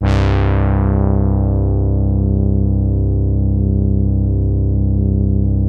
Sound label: QUINT HORN C1, HARD BRASS; Music Store - Claudius Diemeter Schwerlos